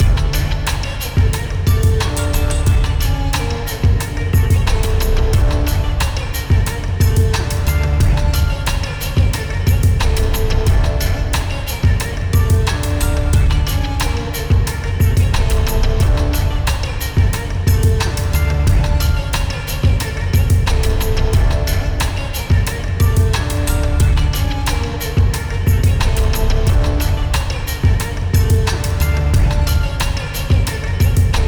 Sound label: Beat; NBA Live 2003; PlayStation 2